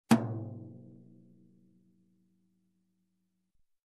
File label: Middle Tom Hit